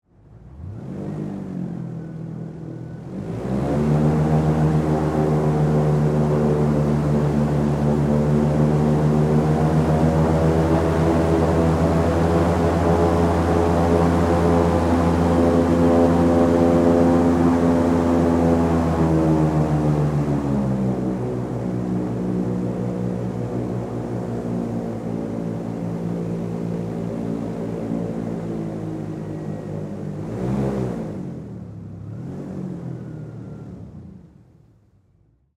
Airboat Run High, Transportation, Watercraft, Airboat, Run, High, Speed, On, Board, Perspective; SoundEffects-BlastWave